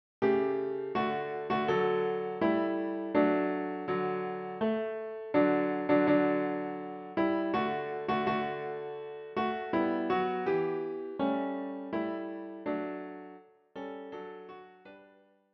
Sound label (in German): O Tannenbaum Klavier; für Klavier solo, mit Vorspiel, Schwierigkeitsgrad 6, mittelschwer